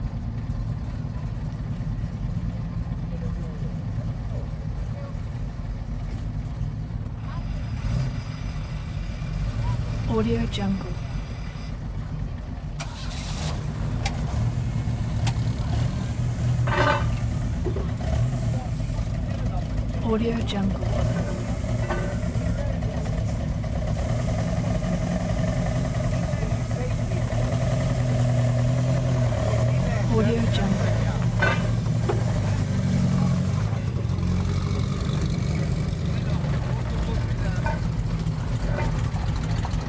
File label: ambiance car rally regrouping stop loop; blazznet